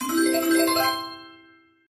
Jelly Splash Filled

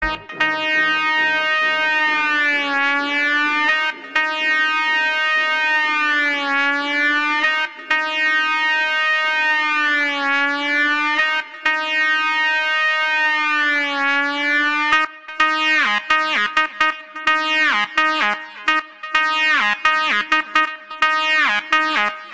Acid Disco

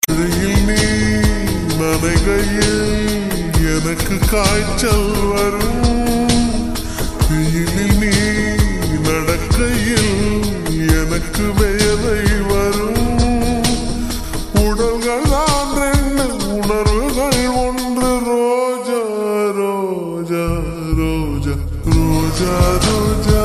Tamil Love Melody

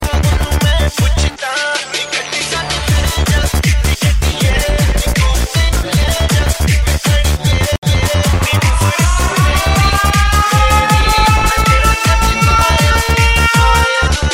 Hiphop Mix

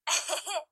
Chuckle

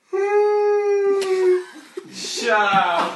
The Yawn

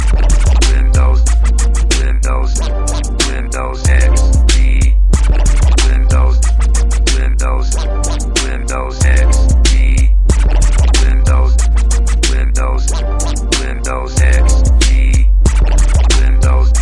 Xp Mega Bass

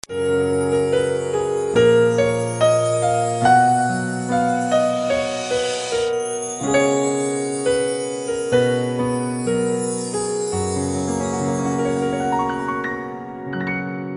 National Song Piano

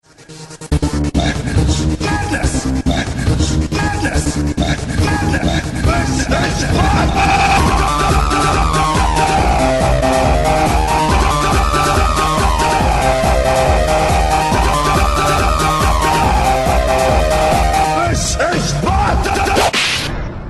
Tehno Remix; 300

